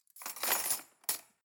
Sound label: cutlery cake forks drawer place